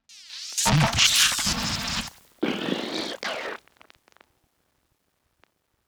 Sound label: Reboot Failure, system failure